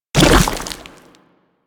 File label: Nechro Mech Step Surface Goo; Step Surfaces